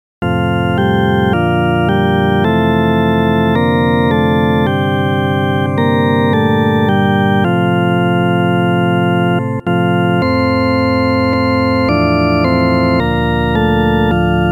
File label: solo organ; regina caeli